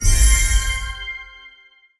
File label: sack accu appear; Butterfly Lovers; wazdan